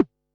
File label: snaredrum, Pearl SC-40